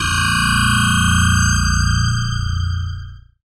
FX Dance

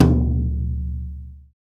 TOM XBRUSH, Brush Kit; Club-50 - Foundations Roland; Brush Kits

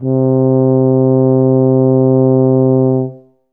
tub C2, Tuba; Best Service ProSamples vol.51 - Classic Orchestra 2

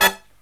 HIGH HIT, Brass Riffs

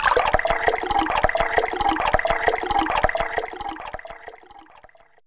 Pour, Fairlight CMI IIe

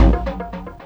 drm 138, Techno, drum-loops; Techno ID

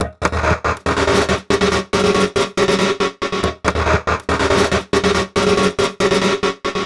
Envelope Beat; Drums & Drones

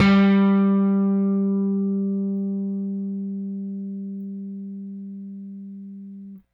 Rickenbacker Bass; Airwindows

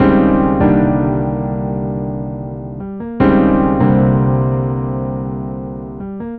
150, G, Keyboards; Total Drum&Bass; 90 s Sample CDs

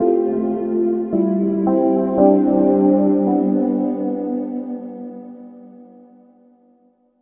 angelic pad; Dr. Fink's Funk Factory

